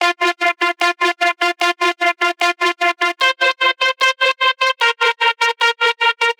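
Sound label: synth loop hectic pad 150 F minor; Virtual Riot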